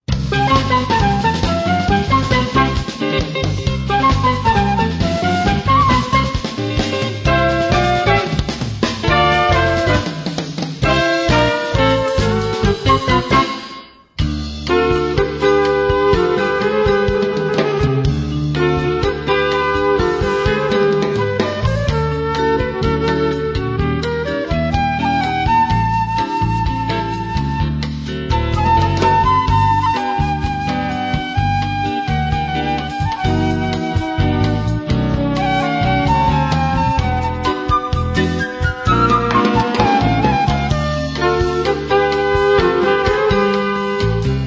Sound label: jazz latin